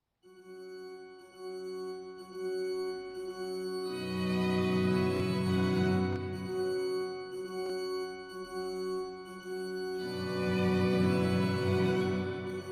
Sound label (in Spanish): Oda a la Alegria con Tenor; L.v.Beethoven; Salida